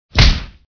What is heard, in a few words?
punch